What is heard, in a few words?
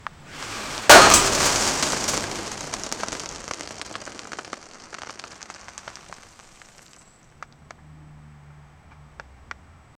vehicle